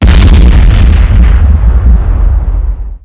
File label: takeoff